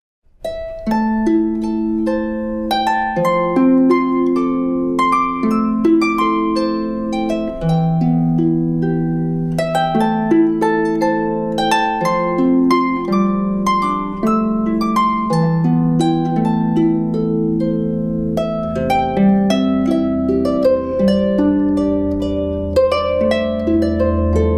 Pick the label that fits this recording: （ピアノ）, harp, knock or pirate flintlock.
harp